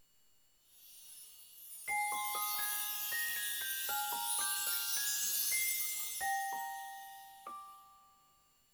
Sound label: Classic Mode Clear; Smash Bros Melee